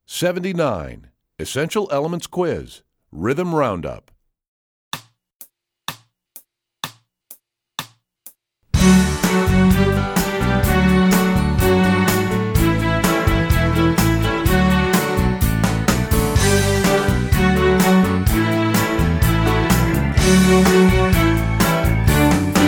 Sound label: Rhythm Round Up, Strings; EE Quiz; EE2000 Book2